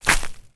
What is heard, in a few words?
foley footstep bark chippings